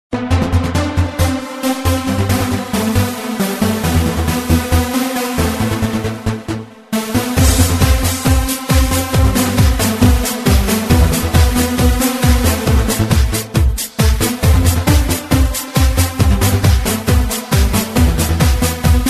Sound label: Club Box Ringtone